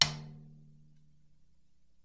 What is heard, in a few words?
MID TOM TAP